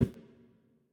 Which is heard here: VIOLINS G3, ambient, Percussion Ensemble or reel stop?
reel stop